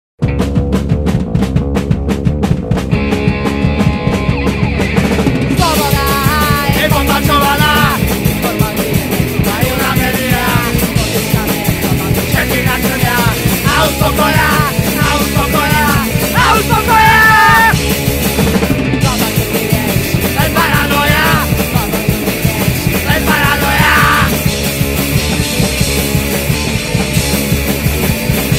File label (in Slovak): HLAS